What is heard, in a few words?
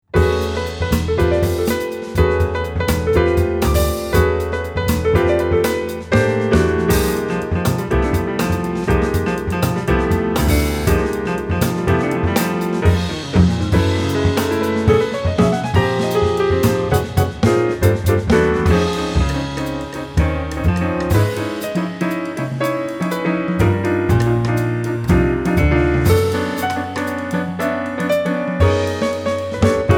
auto reverse sample